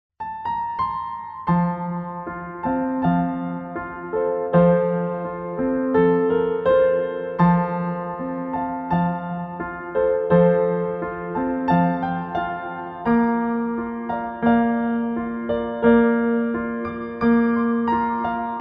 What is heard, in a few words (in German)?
Entspannungsmusik für Babys